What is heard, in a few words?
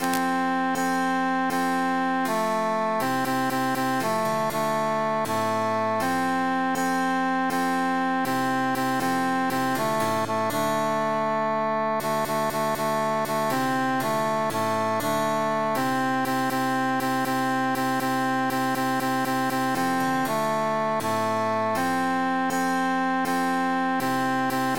tenor emphasized; pase el agoa